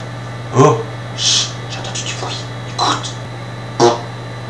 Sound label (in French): bruit conv; svencoop